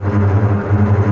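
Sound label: bass tremolo G#2; Digital Sound Factory Virtuoso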